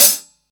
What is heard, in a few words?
hi hat B key; drum single hits one shots